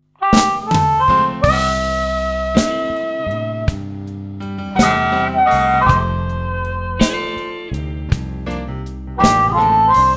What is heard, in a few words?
big harp, ampli harmonica